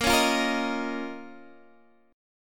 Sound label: Eb 7th Suspended 2nd Bb